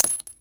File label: Shell 39mm dirt; STALKER; IWP